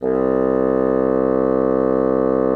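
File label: bassoon mezzo C2; Xsample VOL-6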